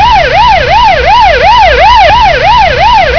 SIREN

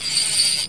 FISH PUL

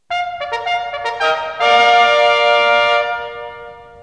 FANFARE

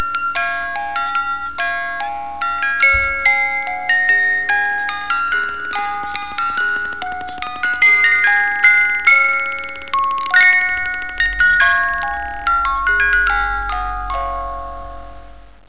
LULLABY

